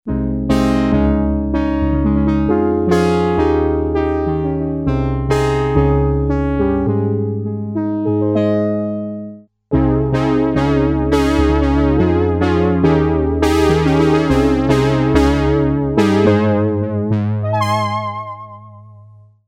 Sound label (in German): P600 Anschlagdynamisch externer Controller; gligli